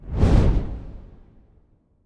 fire; smite; hades

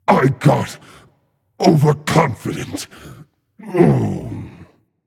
death; cernunnos

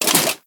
armor legs; smite; god arthur

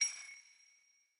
movement run; smite; vamana

